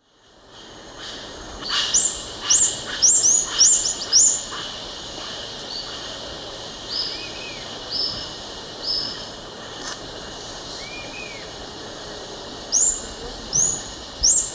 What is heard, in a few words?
Metopothrix aurantiaca, calls; S. America; Eastern Lowlands